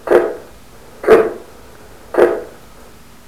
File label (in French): Blaireau Reniflement